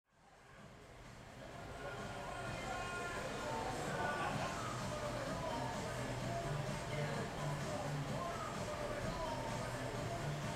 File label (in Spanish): bar plaza cafe; coronda